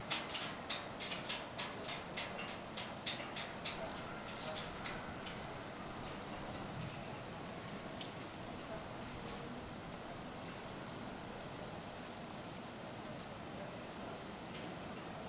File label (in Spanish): Parque poste Bandera; ParqueTierno